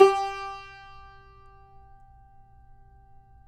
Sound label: CUMBUS G, Guitars; Spectrasonics; Hans Zimmer Guitars VOL-2